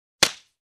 Slap

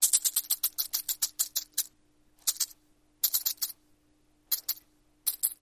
Rattlesnake Western

